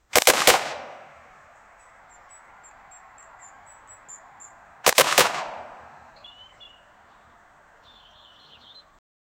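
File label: 80s common pistol gunshot; firearms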